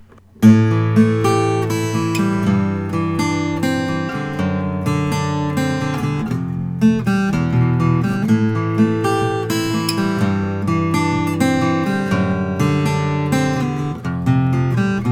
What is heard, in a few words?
santa cruz 1929 00 koa; clear